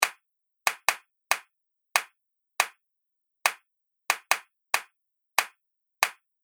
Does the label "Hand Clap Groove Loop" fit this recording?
Yes